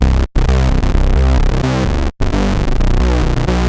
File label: oct, edm bass